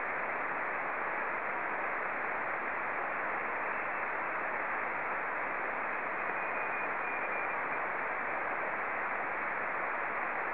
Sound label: ISS test; SM2CEW